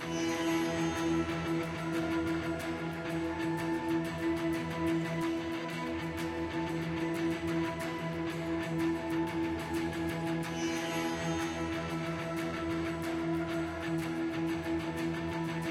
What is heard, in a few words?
Combat Evade Loop; assassin s creed chronicles india